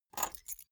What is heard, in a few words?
key ring small grab table